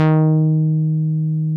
rouge bass, synths; Rubber Chicken Software Sample Bank

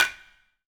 OHTSU, TUZUMI; AKAI S6000 CD-ROM - Volume 5

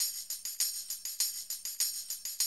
drum loop, 100 BPM

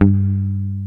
PICK BASS

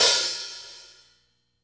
OPEN, HI-HATS; Sampleheads; New York City Drumworks VOL-1; SP HI-HATS

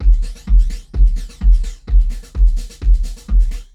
120, loops drums; Brochure; RoBKTA Sample Pack Supreme

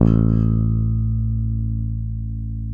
SPEC SUSTAIN, Bass; Sampleheads; Will Lee Bass Library

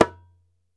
ASHIKO, African Drum

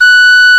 PENNY WHIST; Penny Whistle

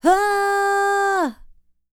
Vocal Planet World Voices, NOMADIC

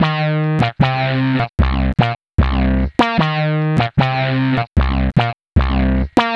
Acid Squelch, Bass

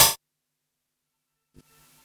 Quasimidi 309, Drums

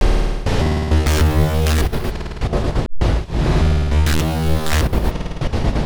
glitch

